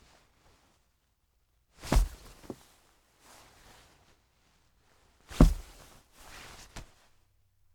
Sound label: Clothes Hitting Wall